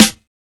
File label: airy steel snare drum c sharp key